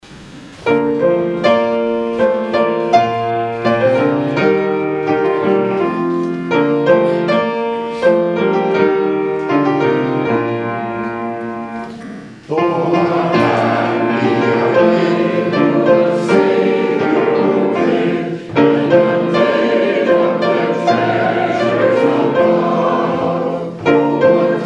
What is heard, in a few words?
Hymn; Oh, Happy Are They